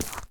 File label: step, dried ghast